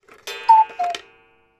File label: household clock cuckoo strike